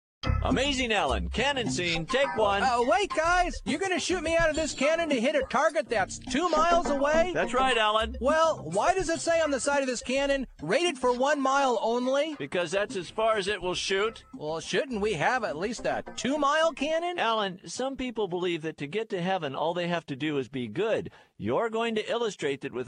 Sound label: cannon